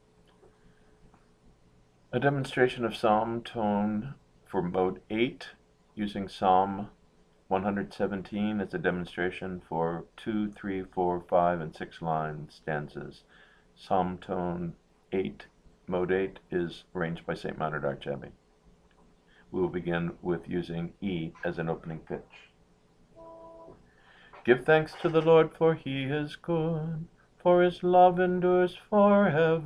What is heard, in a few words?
psalm tone viii